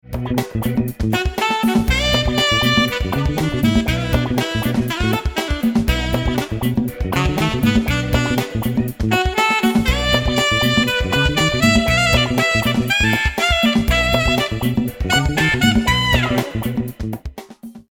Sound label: Funk Alto Drake Ceramic